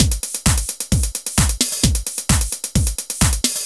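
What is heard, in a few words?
drum loops, left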